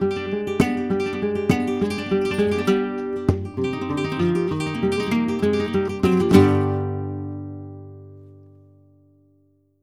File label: flamenco guitar, bulerias y solea